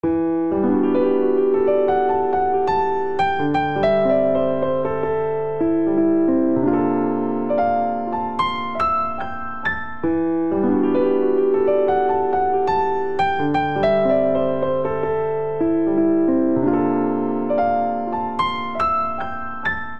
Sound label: Phrase Free Cycle C Major 72 bpm, Grand Piano; MIDI Clips; Ableton; Factory Packs